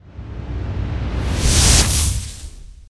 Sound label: Build up; friday the 13th killer puzzle